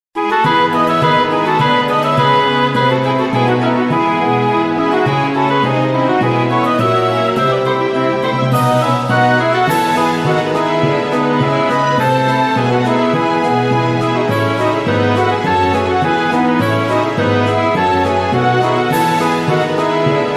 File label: bgm orchestra; maou